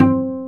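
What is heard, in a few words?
double BASS F3; AKAI S6000 CD-ROM - Ultimate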